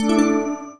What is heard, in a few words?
operation ready; Nokia; 770 Internet Tablet